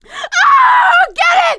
scream while pounced, survivor voice; producer

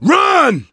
yell run; survivor

